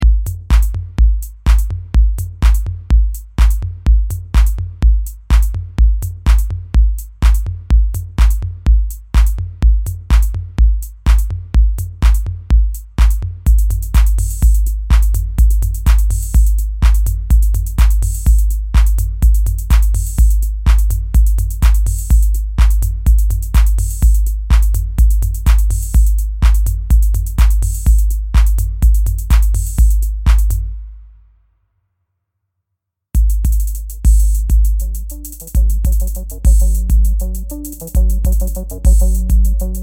808 Kicks

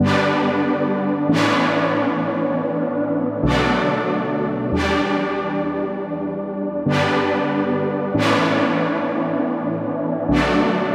chord loop pads 140 G

